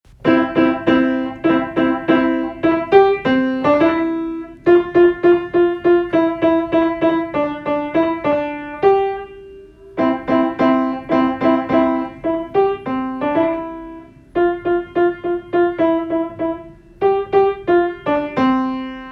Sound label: Jingle Bells Home Recorded